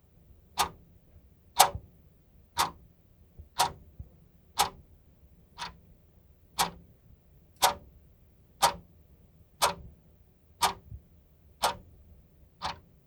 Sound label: Clock Tick